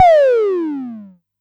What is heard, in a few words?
Amdek Perc; techno drum fx; Analogue DrumHits